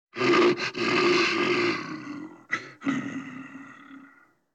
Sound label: bear growls